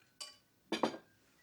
ambience room butchery working layer

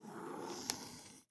ambience room shadow room working layer

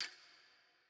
ambience room metal base

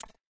ui click cancel

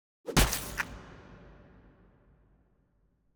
ambience room torture gulliotine cut

